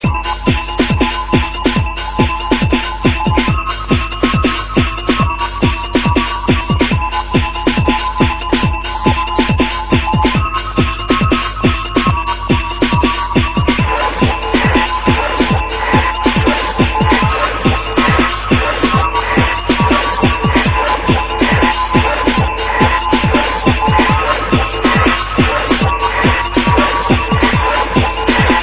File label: dj sample